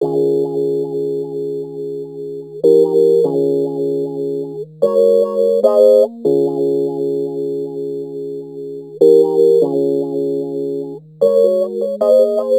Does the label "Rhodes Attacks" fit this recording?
Yes